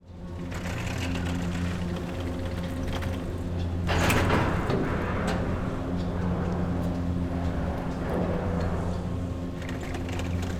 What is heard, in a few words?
Multiple Gondolas Medium Size Passing By Mountain Ski Station Mechanism; Rzpost; The Gondola Lift